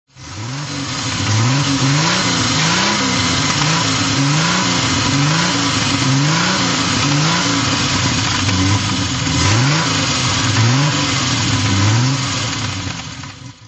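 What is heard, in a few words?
tr3 throttle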